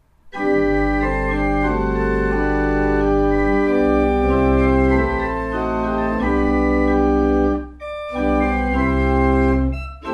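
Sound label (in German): Orgel, pfeifenorgeln; Kreienbrink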